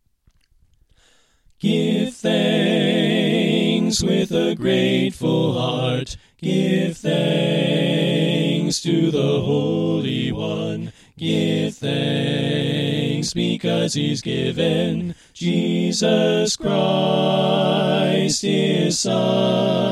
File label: Soprano Right